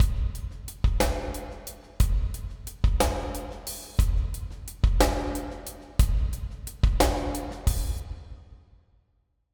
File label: Hall Drums Mix; Boston Hall A; Seventh Heaven Professional